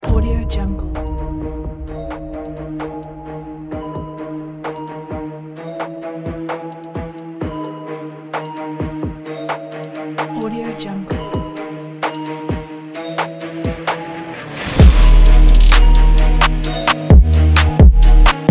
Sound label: epic rap hip hop